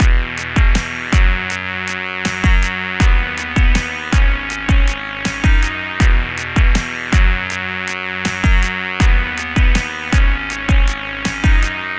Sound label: Backing